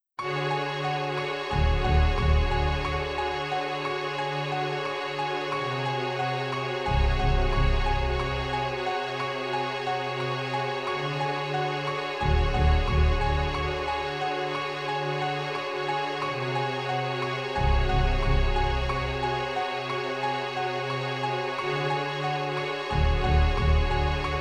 Piano; Anutsu na Piano; kuro no juusan gamerip

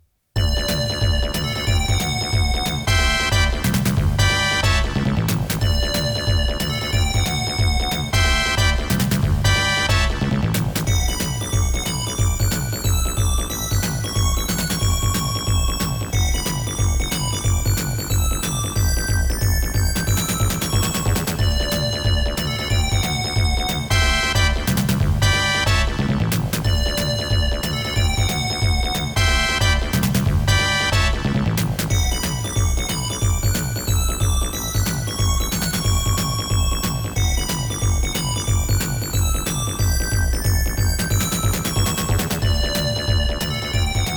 Round Clear; Darius

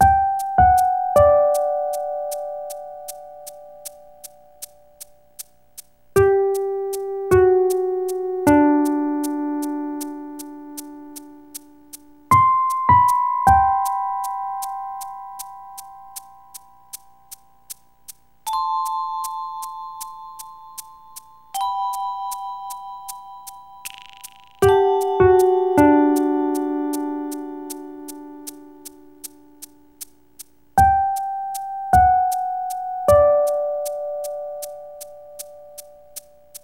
5 AM (Rain); animal crossing let's go to the city

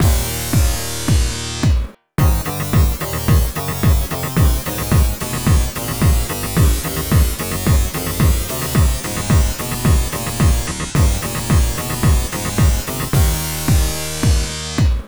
Bonus Failed; dragon s revenge naxat soft gamerip mega drive

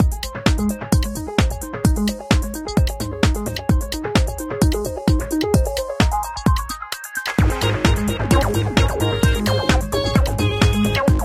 race

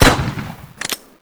KAR98K; blockade classic

